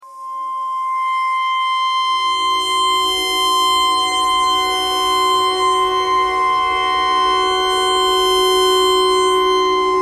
tornado siren clarinet notes e pentatonic

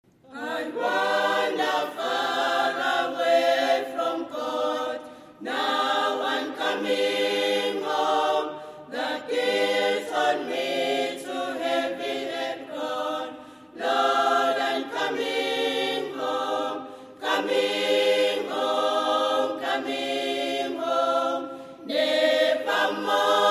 choir

